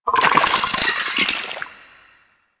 Infested Weakpoint Vocal Chatter; Warframe

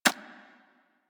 Step; Warframe; Sentients; Brachiolyst

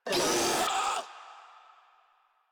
Infested Ancient Player Spotted; Warframe; Proto Infested

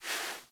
Kubrow Foley Idle Paw Swipe; Warframe

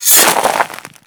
Hammer Success Pull; Warframe; Grineer Hammer Boss; Foley

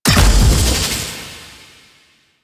Orphix Shield Arm Break Explosion; Warframe; The New War; Sentient Devourer

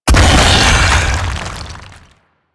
Infested Bone Blade Tentacle Spike Impact A; Warframe

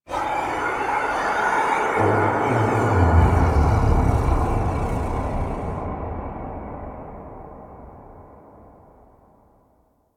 Sky Breaths, Ambience; Warframe; Duviri

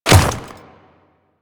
Ice Step, Footsteps; Warframe; Grineer Royal Guard

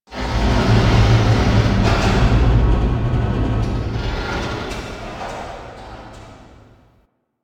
Vault Heart Beat Broken Creak; Ambience; Warframe; Infested Eris

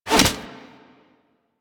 Fishing Spear Line Retract Catch; Warframe; Weapons; Eidolon Fishing Spears

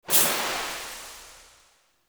Perfect Hit; UI; Minigames; Pied Piper

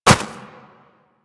Operator Run Snow, Footsteps; Warframe

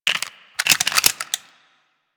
Double Barrel Shotgun Reload Load; Warframe; Tenno

